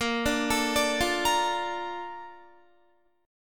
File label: A sharp Major 7th, Guitar; arpeggiated